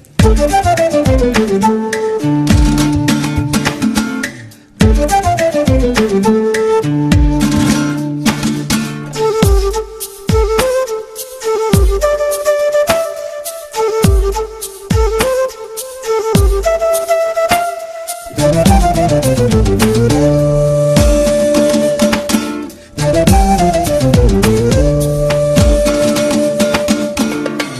BGM, Telugu; Thuppakki

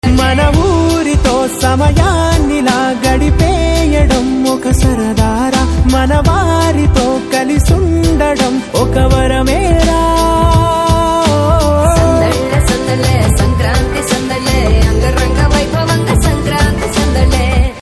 Ringtone, Telugu; Sreekaram

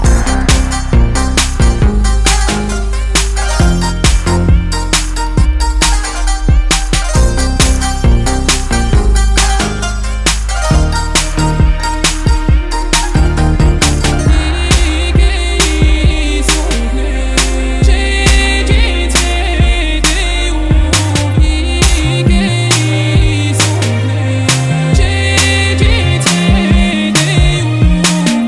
Music; Panjaa; Telugu

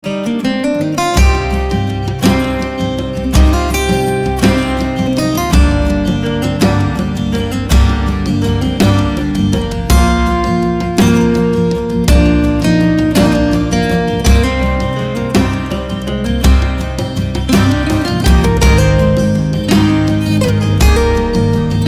Fingerstyle Guitar

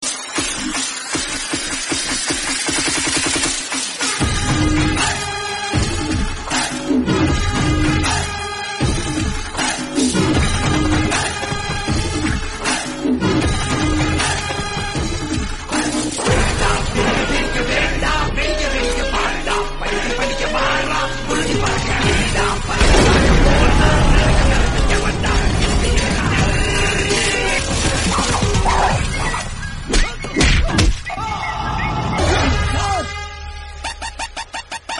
mass bgm; Policeodu; Telugu

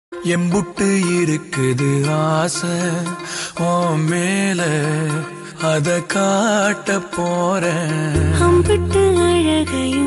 Cute Love Bgm Ringtones

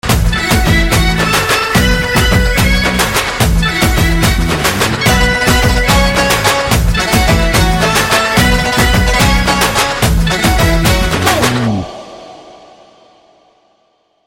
Theme Music BGM, Telugu; Seetimaarr

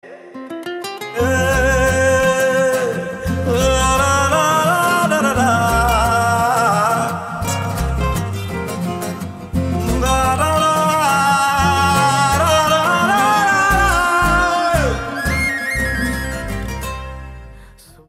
Humming Tone

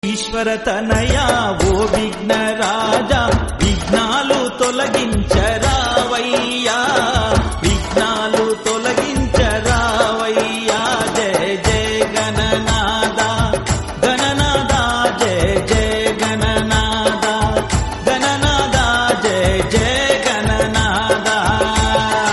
Telugu Devotional Song Ringtone; ganapathi

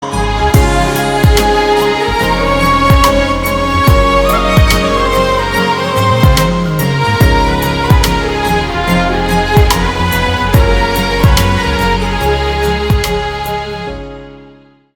Instrumental Ringtone

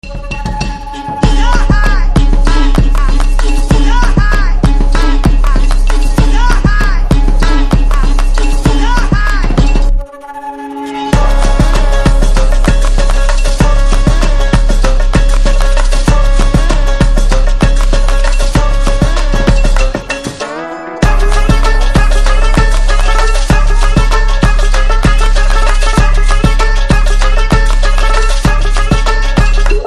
Theme Instrumental Ringtone; Petta; Telugu

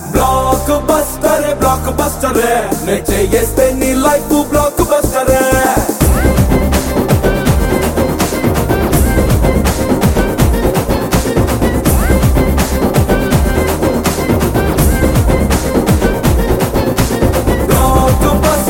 Ringtone, Telugu; Sarrainodu

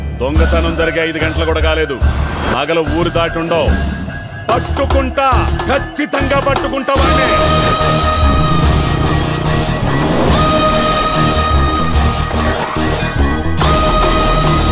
Trailer BGM Ringtone; Bangaru Bullodu; Telugu

